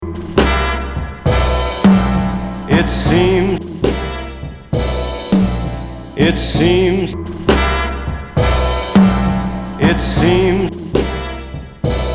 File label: Drums